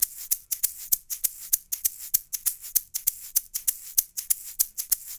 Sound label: LOOP, SHAKERS, Percussion; InVision Interactive; Percussion Slam